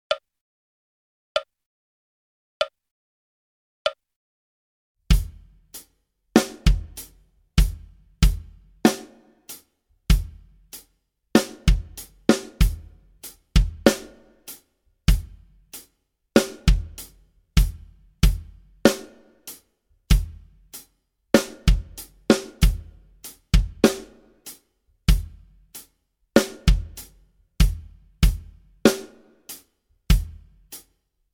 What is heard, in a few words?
Metal Rhythm 48 bpm, Guitar; Guitar Aerobics Disc 2